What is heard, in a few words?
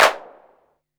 BIG CLAP; THE GENERAL